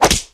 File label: whack; bonny betty